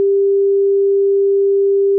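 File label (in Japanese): G4 396, サンプルとしてC4とG4をリンク, サイン波; 平均律と純正律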